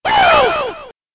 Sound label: splatter